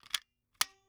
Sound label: Foley Toy Magnum 45 Gun Pulling Trigger